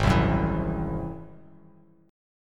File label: Ab Augmented 7th Ab down Bass Standard; Ab+7 strummed; JGuitar